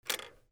GARAGE

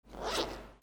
HOUSE

